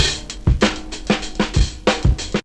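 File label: lk fnky br, like funky break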